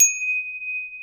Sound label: G#4 STRUCK, STRUCK CHMES; AKAI S6000 CD-ROM; WorldSounds